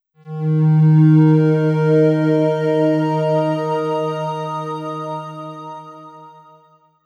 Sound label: FM Pad D#; Pads